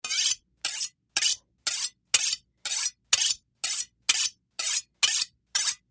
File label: Sharp Carv Knife Fst, utensils